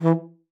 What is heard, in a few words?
E3, alto sax; Xsample VOL-2